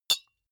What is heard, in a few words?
deodorant hit, metal; soundpool